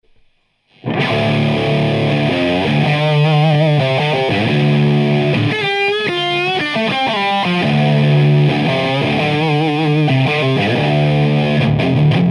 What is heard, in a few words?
lead